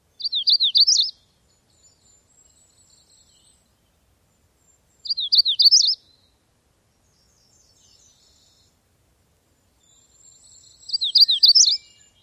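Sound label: Magnolia Warbler, songs number 3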